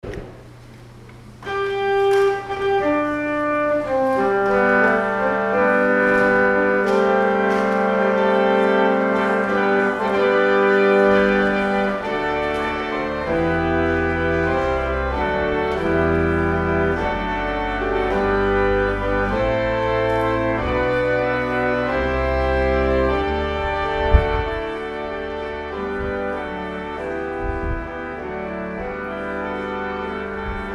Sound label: Postlude improvisation; St. Peters Hebron